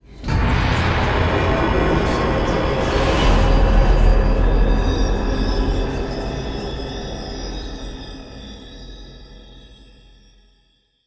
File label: event death cult; Stellaris; necroids